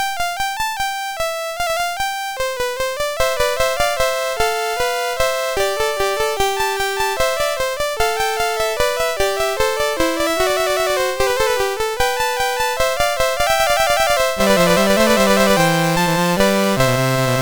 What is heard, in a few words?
Trumpet Tune, MOS6581R3